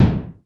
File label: NOIZ KIK